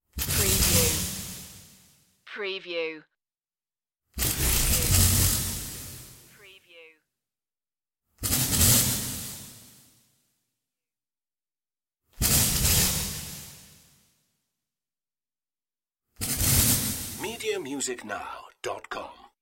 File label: space thunder strikes